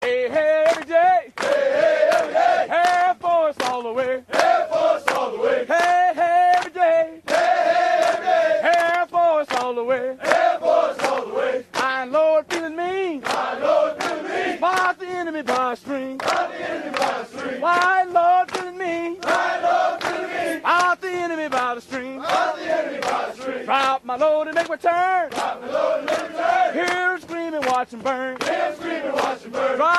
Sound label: USAF Cadence